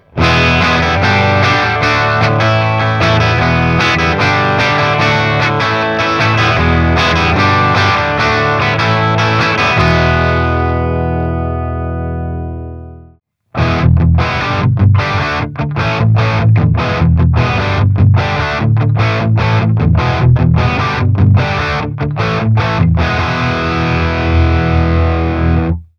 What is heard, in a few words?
Marshall Jubilee Greenback, guitars; kaappitesti